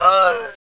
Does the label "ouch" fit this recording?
Yes